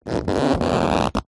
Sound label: paper glossy hand rub squeak; foley